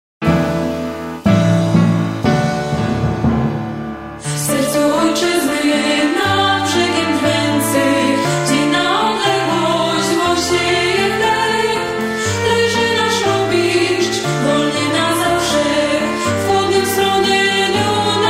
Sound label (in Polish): hymn